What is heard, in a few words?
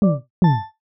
quote bracket lock